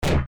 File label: snare; TRUTH-HZ; Crowdsourced Sample Pack; Drum Kit; Claps & Snares